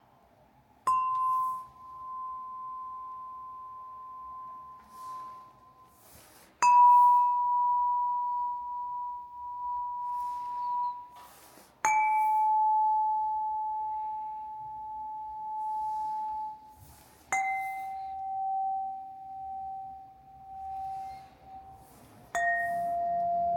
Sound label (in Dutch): kristallen harp